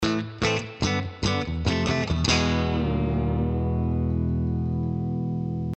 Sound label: Surf